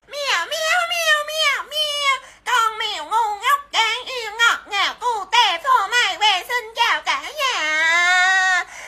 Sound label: meo meo meo